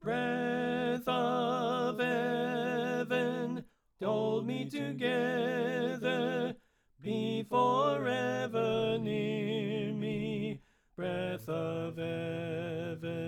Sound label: Tenor Left